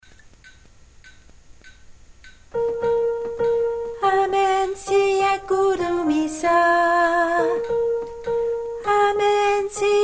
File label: soprano